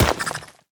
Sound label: spiders hero tunneling appear